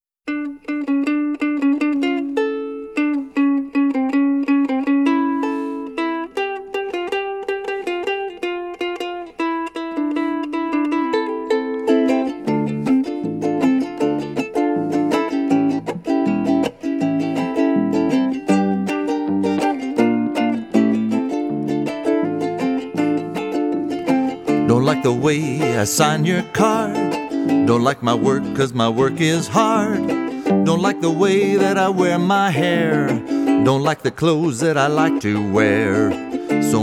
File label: I Like Singing The Blues